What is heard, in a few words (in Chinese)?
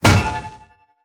重锤空中重击声